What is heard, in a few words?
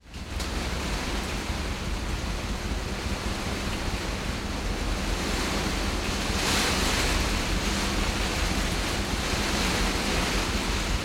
rain hail wind recorded inside greenhouse